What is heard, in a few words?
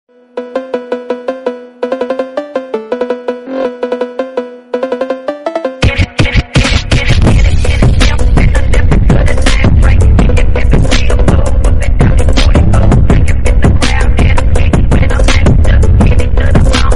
phonk